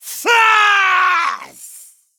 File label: Death